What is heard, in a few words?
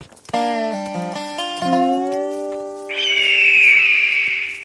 hawk guitar